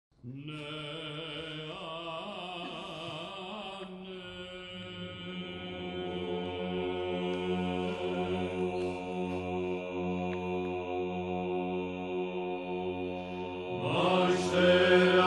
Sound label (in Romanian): Troparul Craciunului; Pentru Craciun; Stavropoleos; Cantari la Nasterea Domnului